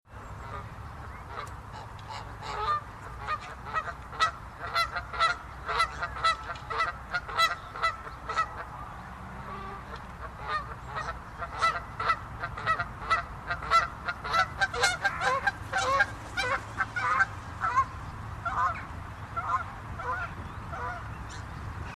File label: Canada Goose